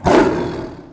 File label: hurt; CosmicRage; combat; creatures; tiger